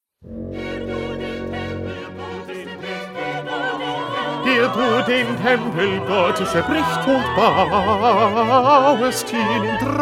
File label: Bass; Bach; St Matthew Passion